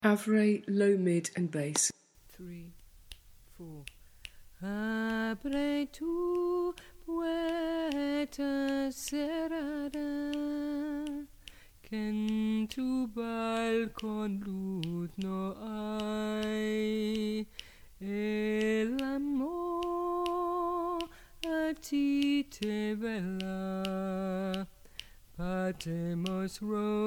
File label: refrain low mid and bass; Avre D